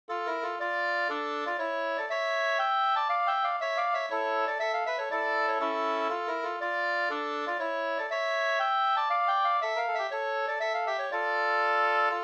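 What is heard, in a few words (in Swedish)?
polska, tvåstämmig; fr Hamra Orsa Finnmark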